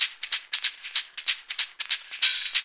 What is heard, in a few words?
LOOP; E-Lab; X Static Goldmine 2